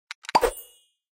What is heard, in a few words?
Inventory Popup Out; candy crush saga gamerip